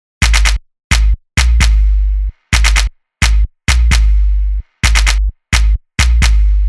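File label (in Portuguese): beat sem pausa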